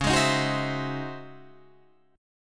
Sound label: Db Minor Major 11th, Guitar, Drop D; strummed